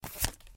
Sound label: Player Change Card; MiSide